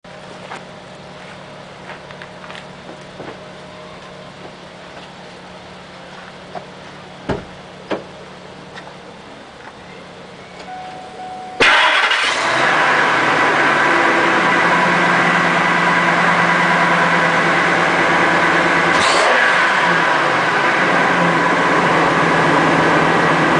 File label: v10 stock exhaust